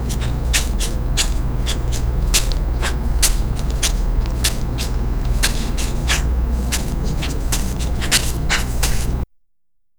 soft paw footsteps of a, domestic

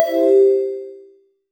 arcade like sound; games

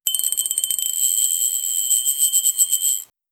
a pristine high fidelity, game show

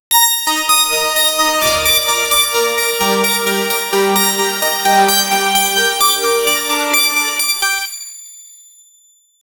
techno, sound-effects

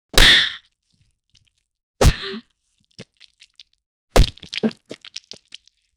a big watermelon smashing

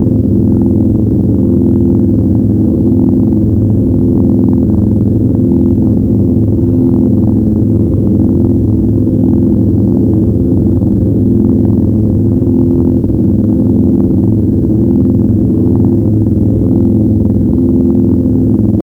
mini drone for circuit

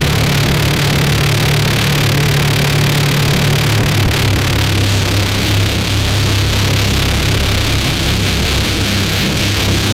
a cammed straight piped, automotive

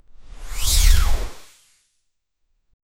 sweep sonorous glare on t, fighting

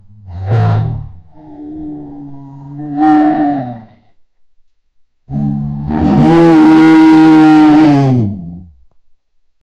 loud roar and growl of, bear

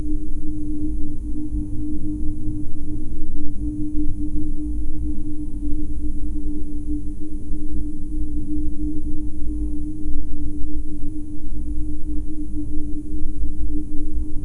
slow blood flow

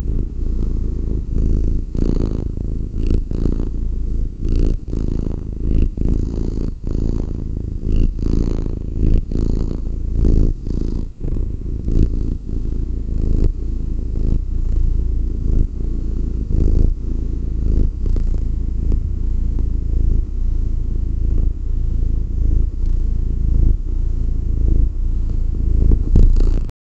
the sound of a cat

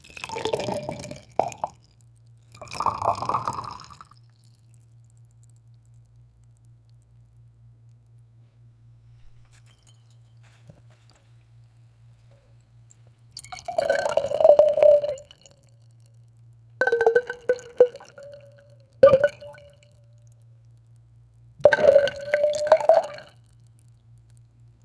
bubbling of liquids in gl

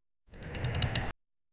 relaxing button clicking

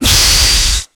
loud cobra attack lunge, snake

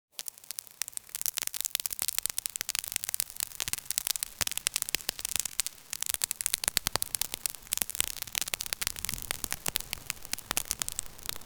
asteroid cracking

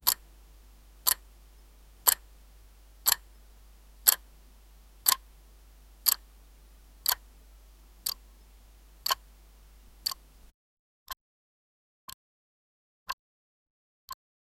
time stops followed by th

